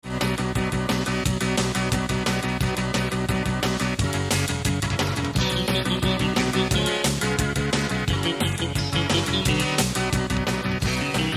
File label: melodiya; muzykalnaya skazka